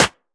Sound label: percussion; Vengeance Samples